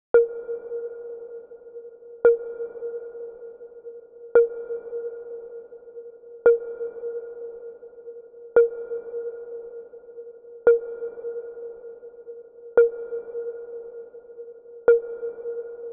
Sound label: Drum Loop - 114 BPM Tone, RnB; City Lights; Cymatics; ANALOG EVOLUTION Beta